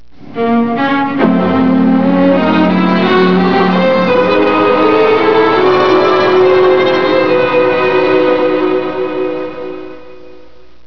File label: Stinger